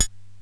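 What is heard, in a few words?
closed hi-hat, Casio SK1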